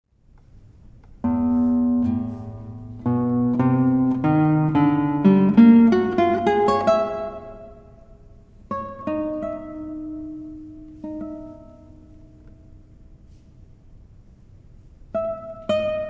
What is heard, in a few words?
Recitativo LIVE; miami